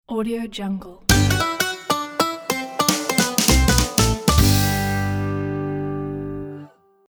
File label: bluegrass reveal; drtimwaters